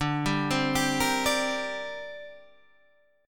D 7th Suspended 4th Sharp 5th, D, down, Guitar Standard; arpeggiated; JGuitar